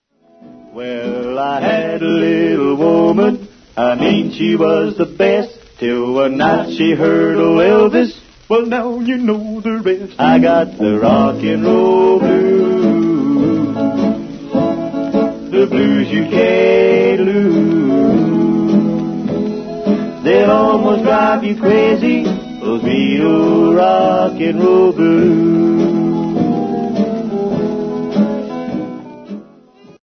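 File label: rock and roll blues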